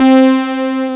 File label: SYN MELOD; Sound & Vision - Gigapack I CD 2